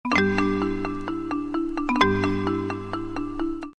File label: samsung galaxy pro